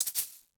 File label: Menu Escape; Custom UI Sounds; Stardew Valley